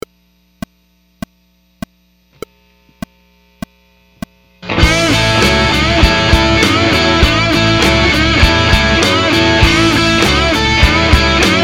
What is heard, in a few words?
12 Bar Blues in A